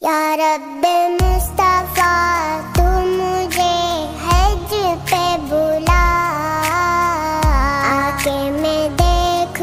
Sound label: Beautiful Naat Ringtone